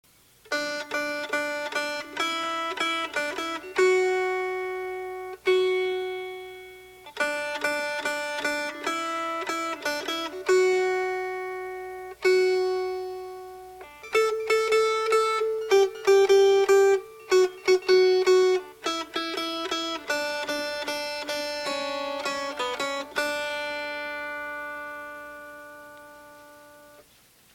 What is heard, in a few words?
Melody; Everybody Loves Saturday Night